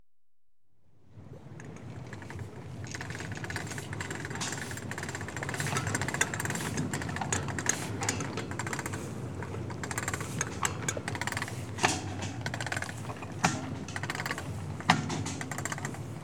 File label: Thames Sailing Barge, Hoisting; Ships And Boats; BBC Sound Effects Library; Ships And Boats 1